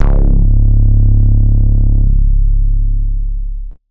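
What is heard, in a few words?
90s club bass C#2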